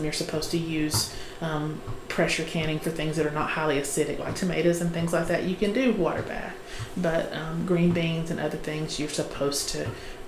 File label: Canning